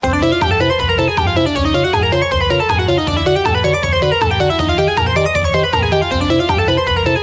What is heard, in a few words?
Jingle Escape